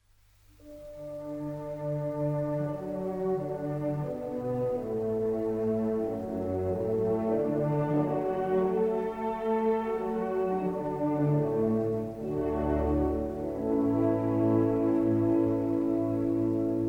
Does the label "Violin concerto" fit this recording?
Yes